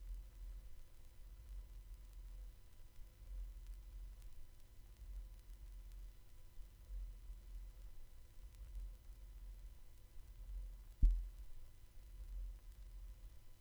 Silent Groove, Audio-Technica AT-LP2D-USB Turntable; Ultimate Analogue Test LP